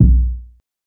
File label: fluffy kick drum one shot b key, Royality free kickdrum tuned to the B note